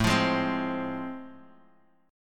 A Minor Major 7th Sharp 5th A Guitar Drop D, chord